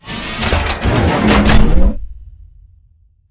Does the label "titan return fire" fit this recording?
Yes